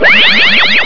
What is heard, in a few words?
Phasers; iLinux